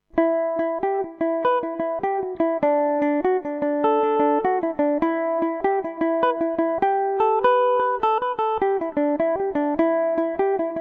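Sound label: E minor revelation, Irish mandolin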